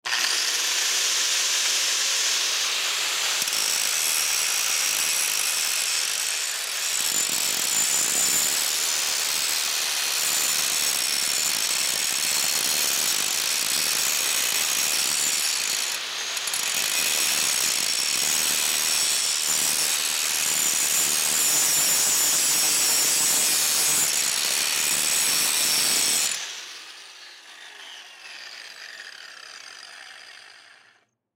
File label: Grinder Electric, Grinder Electric Scrape Metal; Grinders; Industry; Blastwave